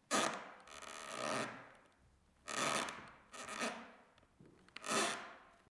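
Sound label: floor creak sound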